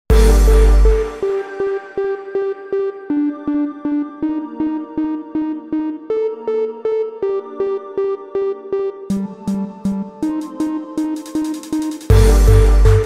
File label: Urban Drama; Soundzabound Volume 5